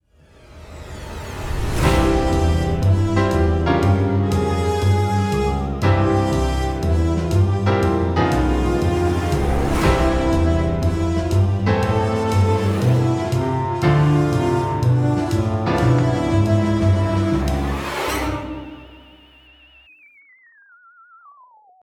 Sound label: Theme (short); Split the Room; The Jackbox Party Pack 5; Jackbox Games